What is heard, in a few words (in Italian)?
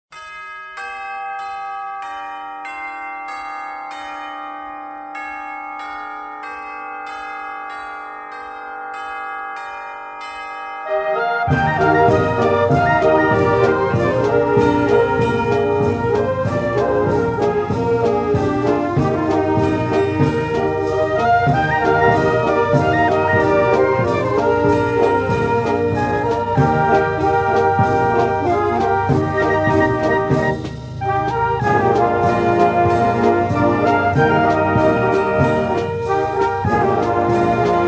Marcia, Fanfara; Le campane di San Giusto; Fanfara Brigata Alpina JULIA